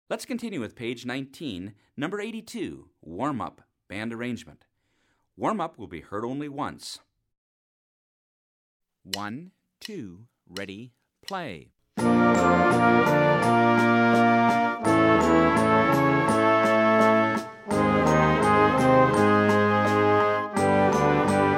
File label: warm up